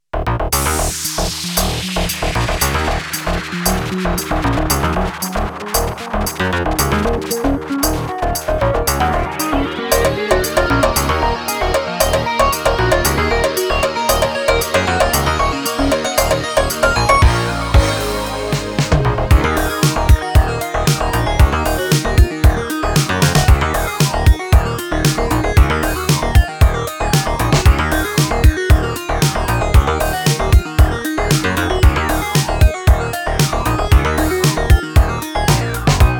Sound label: eletronic